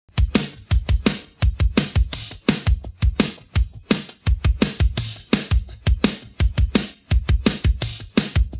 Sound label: pattern, drums